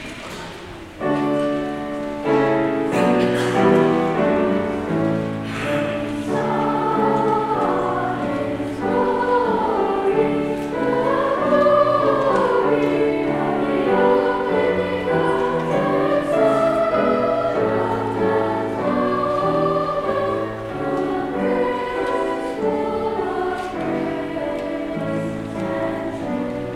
Choral; 8:30 service

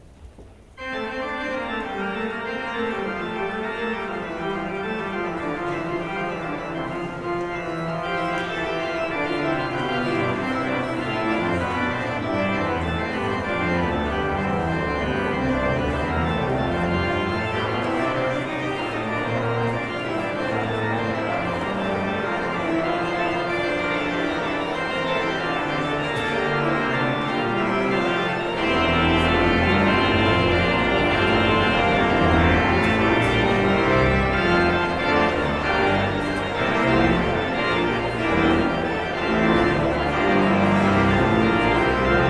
Postlude; Oh That I Had a Thousand Voices

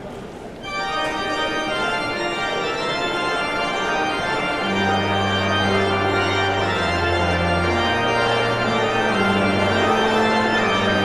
Postlude at the organ; Savior of the Nations Come

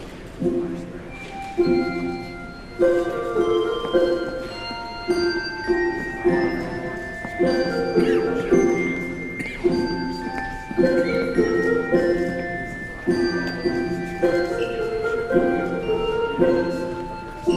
Handbells; Father We Thank Thee

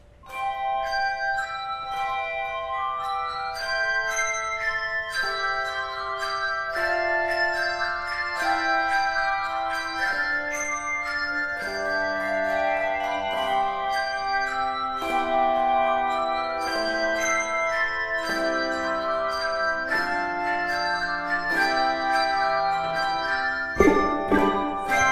Handbells; O Morning Star How Fair and Bright

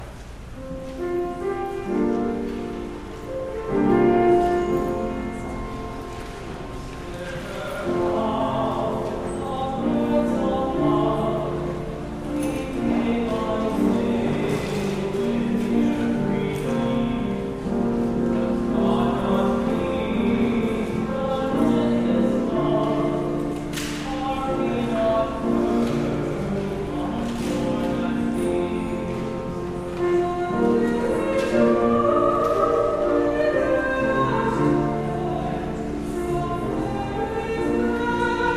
Choral

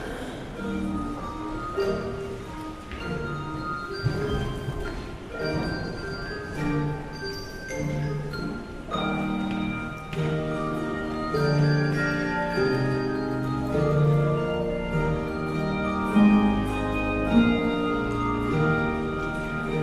Handbells; Gods Own Child

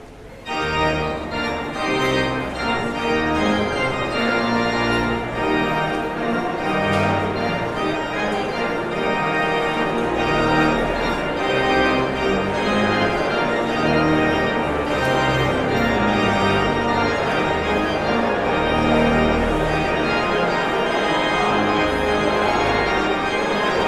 Postlude

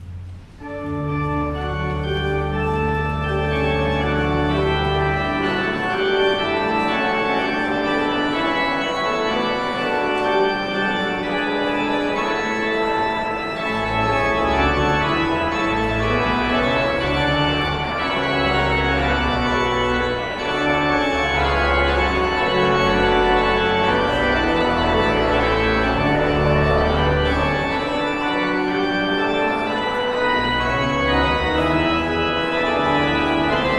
Postlude

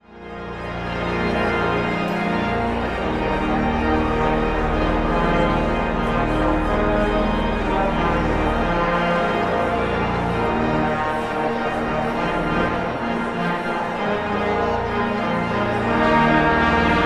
Postlude

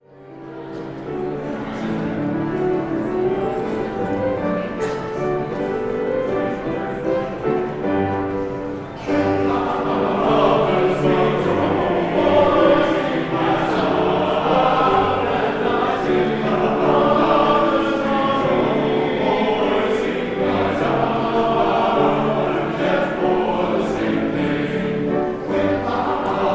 Choral

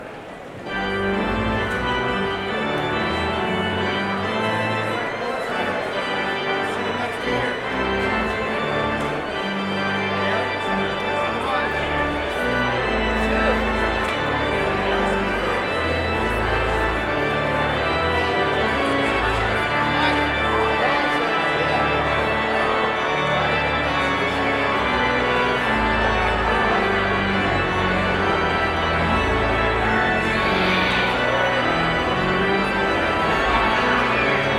Postlude

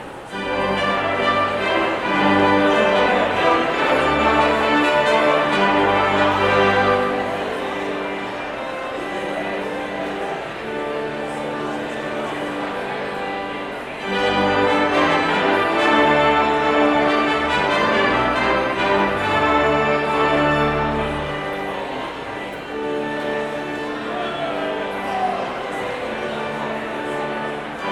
Postlude Gavotte; Praetorius

